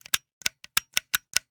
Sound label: Flashlight On Off; Tools; 1001 Sound Effects